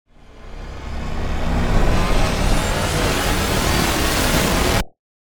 Metal wires intense riser

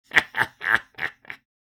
Elderly man giggles and chuckles; laugh

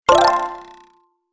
Cartoon confirm button

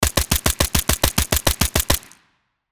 M240B machine gun automatic fire

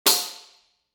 Tambourine single

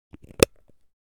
Camera lens cap on

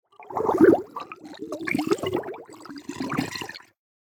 Underwater movement with fizzle

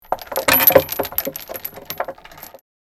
Chains dragging on wood

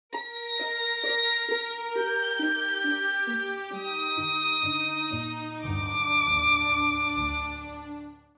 Game over you lose gentle romantic

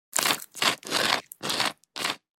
Toast butter spread butter knife

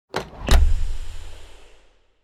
Sci fi laboratory door air release opening

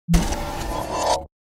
Sci-fi door sliding closing

